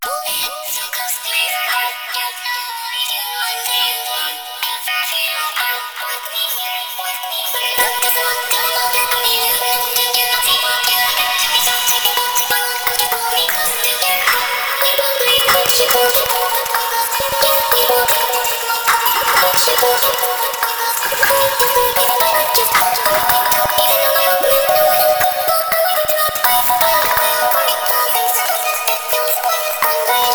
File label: acapella